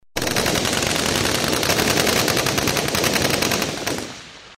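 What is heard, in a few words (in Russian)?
стрельба автоматов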